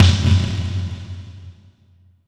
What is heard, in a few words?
VERB SN; East West - Phat & Phunky